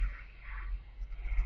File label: Attic EVP; caliente pizza draft house crafton investigation